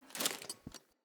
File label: Battle Dog Foley Jump; Snc Battle Dog